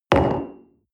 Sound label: coffee place table medium, restaurant cafe